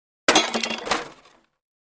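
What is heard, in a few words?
Junk Pile Impact Small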